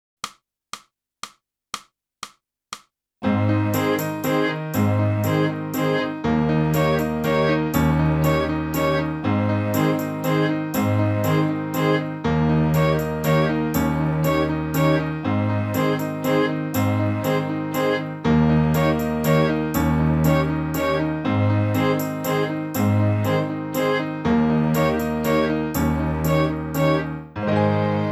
clarinet; boosey method

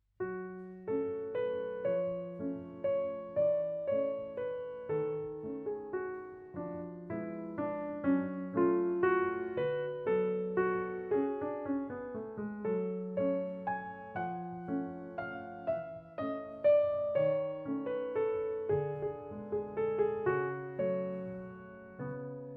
piano

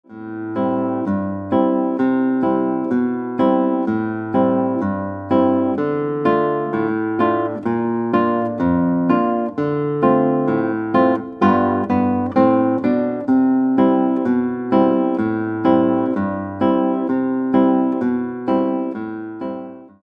Guitar

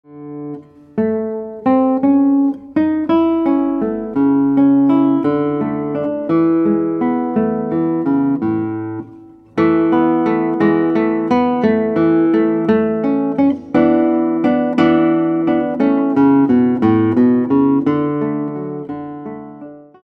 Guitar

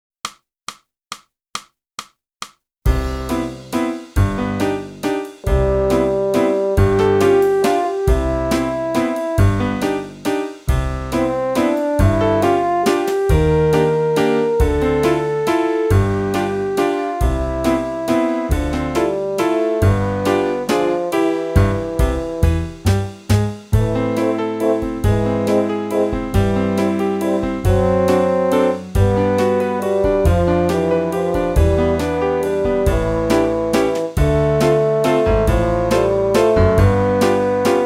flute; Boosey Woodwind Method: Flute (Book 1)

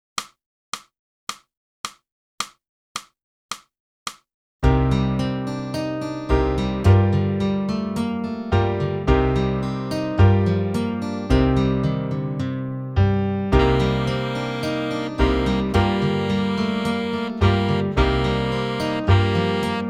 sax

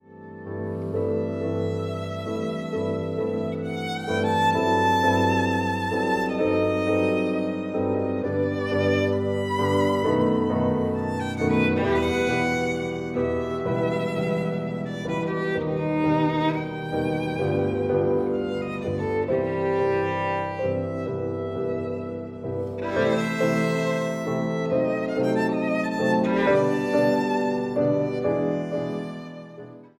VIOLIN; Trinity College London violin exams for 2016-2019; Delius: Elégie from Suite for Violin and Orchestra

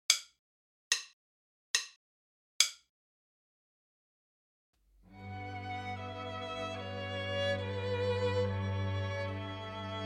minus Viola

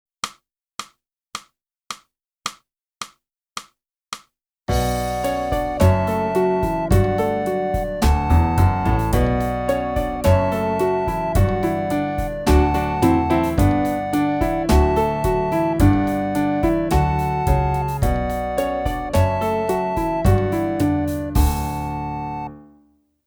flute; Boosey Woodwind Method: Flute (Book 1)

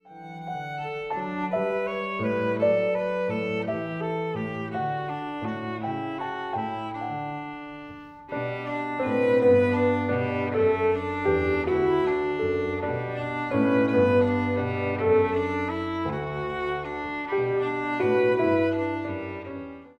VIOLIN

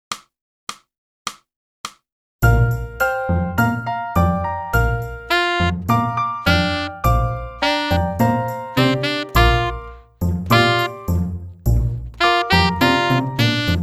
sax

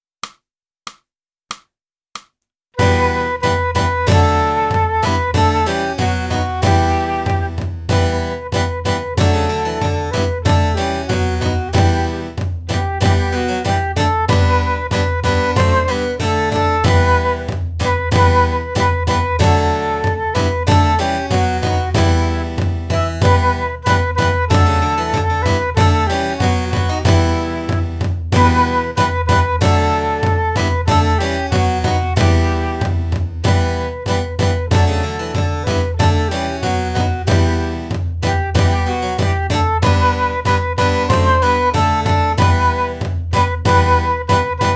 flute

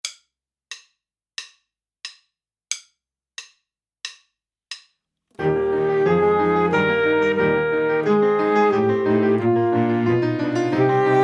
Chorale Full Sax

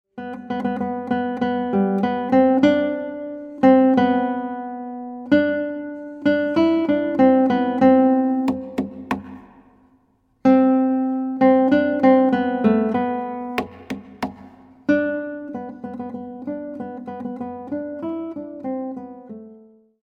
Guitar

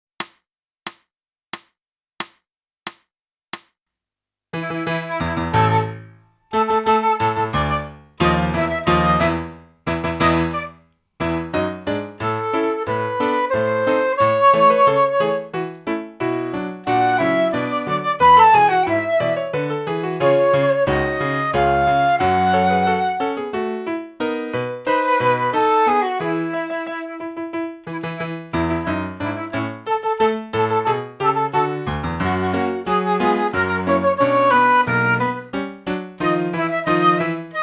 flute, dance with trumpets

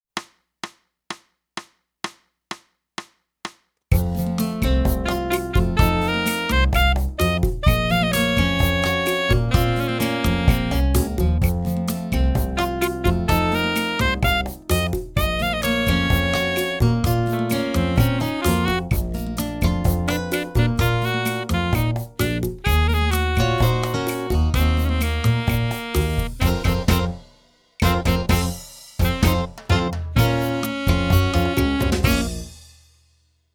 sax

